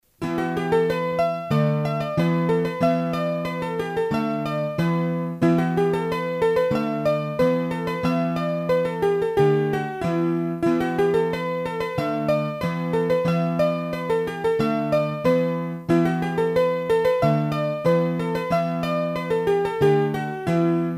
Scottishes, hurdy gurdy; Brouillard

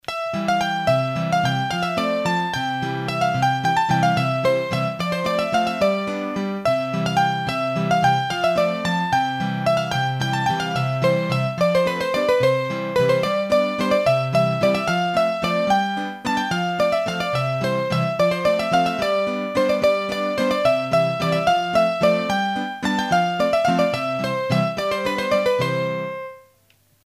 Valse, hurdy-gurdy; Valse a Bouscatel; Massif Central

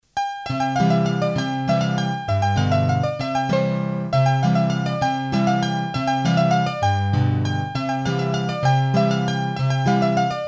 Bourree, hurdy-gurdy, Auvergne

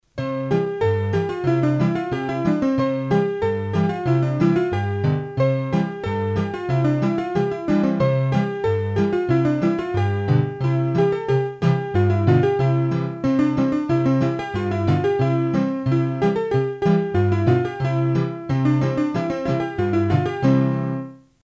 Scottische, hurdy-gurdy

